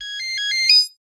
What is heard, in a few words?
Phone Ringer